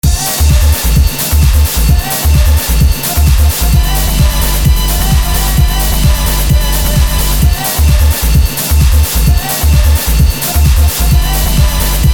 disco

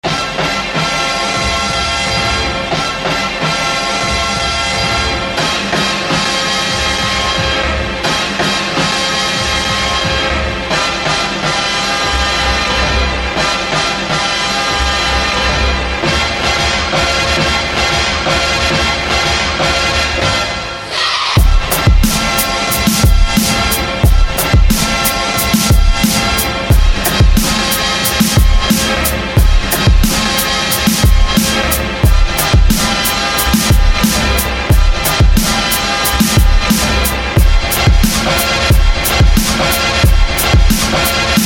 horns

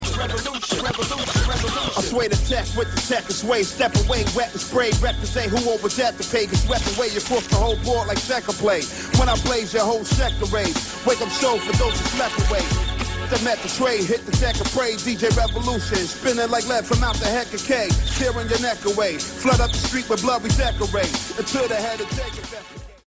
G rap

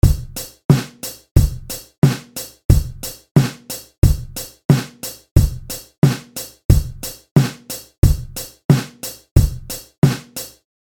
sec beat

